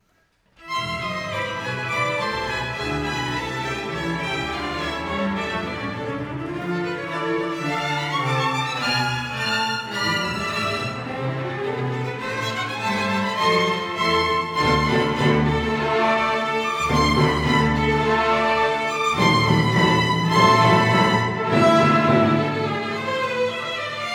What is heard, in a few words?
Orc Wave, x-y; Stereo